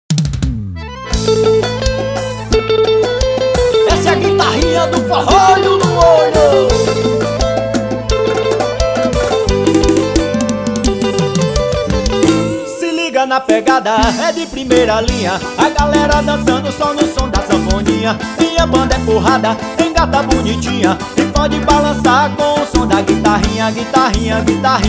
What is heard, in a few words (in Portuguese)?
so na guitarrinha forro